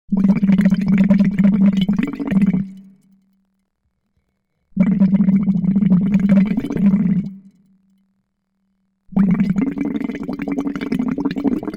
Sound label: Gurgle